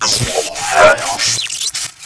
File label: New Gib